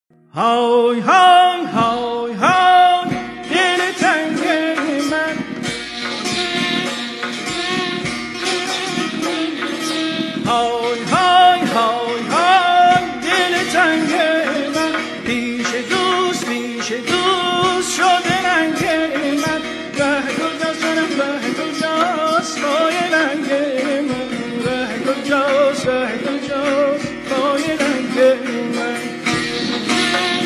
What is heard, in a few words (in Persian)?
Tasnif